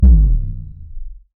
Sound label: special item drop